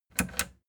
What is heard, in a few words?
plastic button latch click disable fast